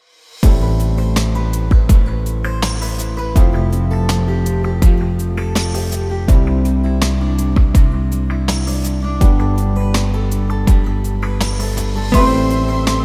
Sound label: soft love slow; ask; by burak sound bank; dramatic dram vol 10